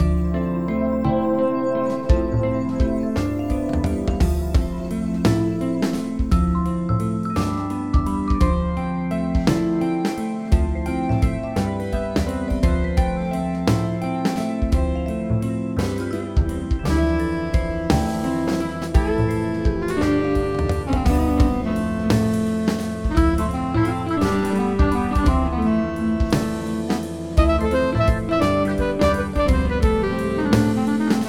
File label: Sea Swimmer; Vibratonic